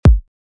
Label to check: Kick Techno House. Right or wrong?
Right